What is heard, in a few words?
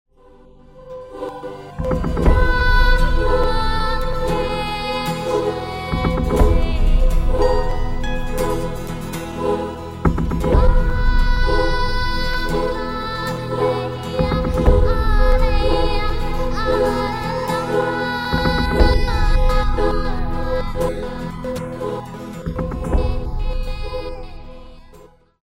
Sister Drum